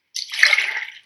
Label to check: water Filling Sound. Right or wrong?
Right